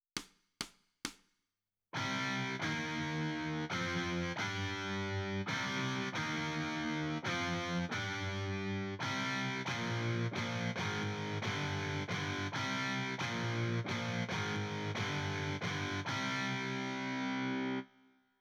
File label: Guitar Right